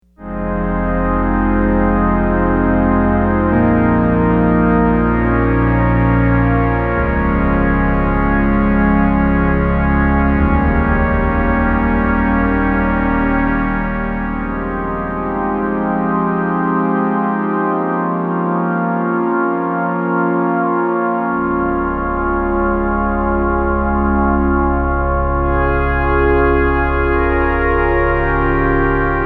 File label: PAD Dark Church